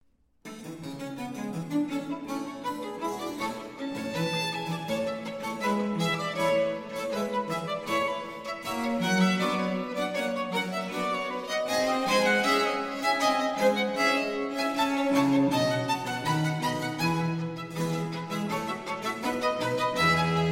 Trio Sonatas, No. 10 in B-Flat Major, Gavotta. Presto; 12 Trio Sonatas, Op. 1; RV 78; Vivaldi; L'Estravagante